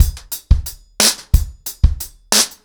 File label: 90BPM, acoustic drums, drumloops